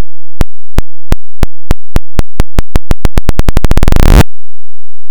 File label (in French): balle